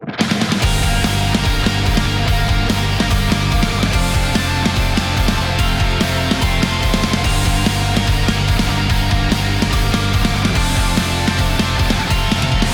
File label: Song Prayer